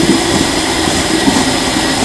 poison gun shoot

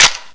blaser foley

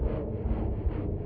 machine, ambience

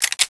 reload

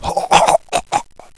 pain, bot; cooked

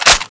clip in; balrog7

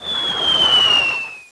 mortar hit; chicken fortress 2

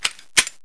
dde clip in

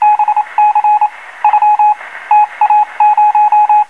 pepeep, ambience

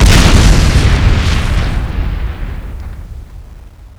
explode; chicken fortress 2

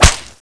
catapult

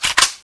an94 bolt pull, weapons

